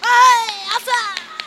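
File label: rhythmic vocal; Best Service; World Colours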